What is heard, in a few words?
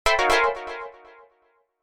gravity change; KevinBlast